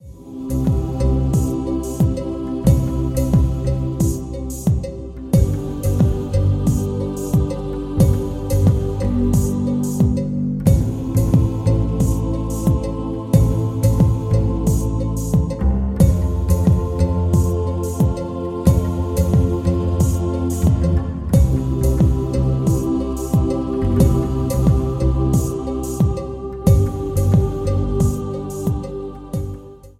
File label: warm, bgm